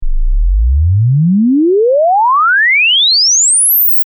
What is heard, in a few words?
sine sweep 100 sample table 20 20k